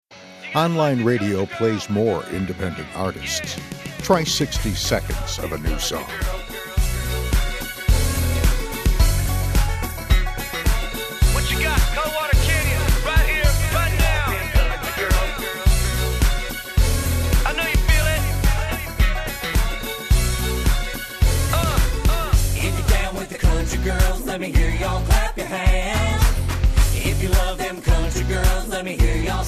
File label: country